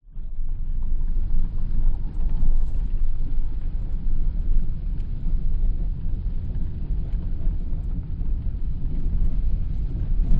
vehicles car driving bumpy dirt track wet internal windows slightly open bumps; rattles; zapsplat